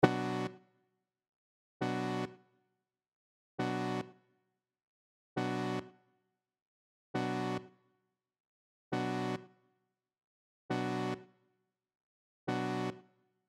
Brass Synthesizer

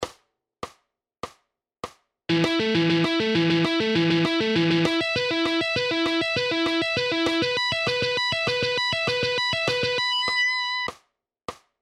Pentatonic Scale Guitar Licks

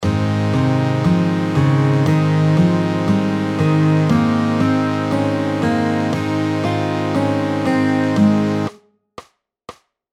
A Major Scale

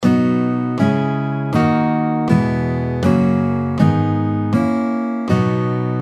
B Major Chord Scale, guitar

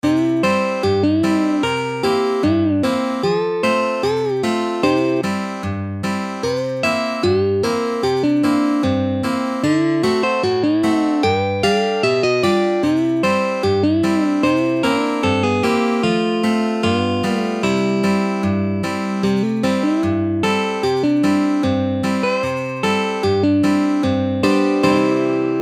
Country Licks Country Bending Solo, guitar; Arlen Roth

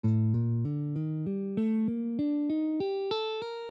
A Iwato, guitar

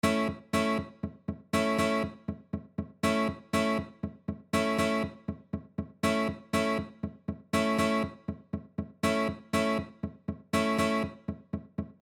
Rhythm, guitar

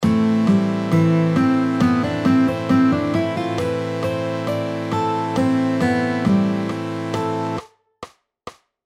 Lick, guitar

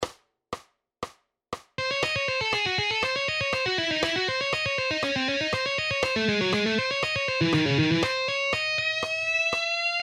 C Sharp Harmonic minor Lick, guitar

